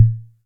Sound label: low tom, Yamaha MR10; drum machines